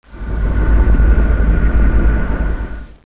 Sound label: fleet beacon, protoss; StarCraft v2.2.2; Pocket Pc Software